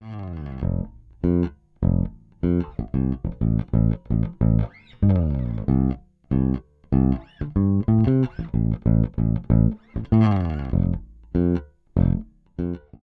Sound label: DI Tube; Zoom B2 Four